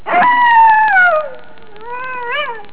animal

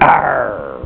argh

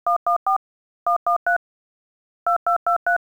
TELEPHONE

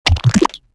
BUBBLE

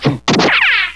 cartoon

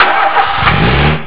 lighting

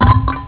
clunk

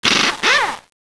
ratchet

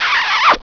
KISS

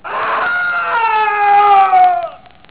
yell

